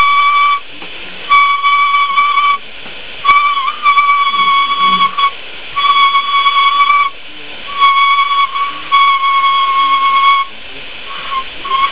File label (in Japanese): fue, jomon; hosogoe